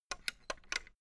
fence gate latch rattle open; objekte; tueren

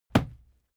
fight impact sweetener cloth; krieg-waffen; nahkampf; soundpool

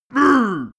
male hit uh, schreie

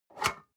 storage lid slide open medium, box; objekte

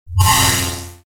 energizing event

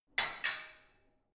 barbell only lift up, fitnessstudio; sport freizeit

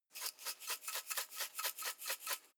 herbs shake dull sequence; soundpool

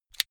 car flip key eject

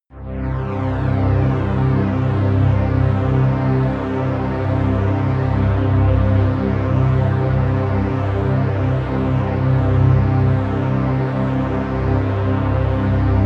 pad sweep flanger; flaechen

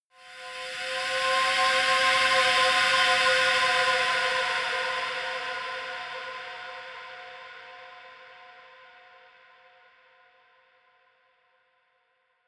erratic cry high, drones

